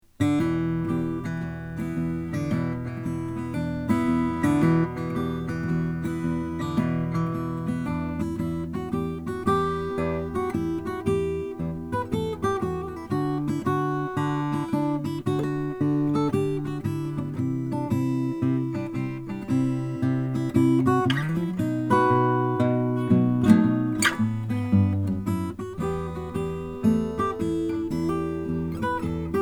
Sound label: rasmussen c madagascar rosewood